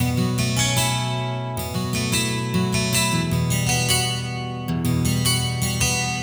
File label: 12 String Guitar; Sonic Foundry (Sony Creative Software); Mac Money; Guitars